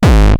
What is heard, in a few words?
Roland TR-909 BD; BD Selection